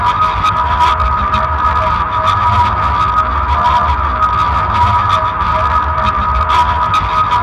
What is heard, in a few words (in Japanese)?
kinzoku; 金属音２; ループ用 工場のようなバックサウンド 狂気・恐怖のイメージ音